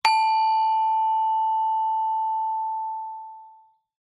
musical instrument gender small gamelan single mallet hit high